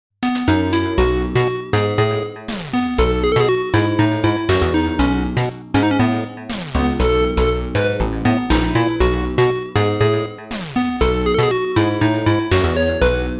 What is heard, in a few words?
8bit